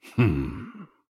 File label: Hmmm; Hanzo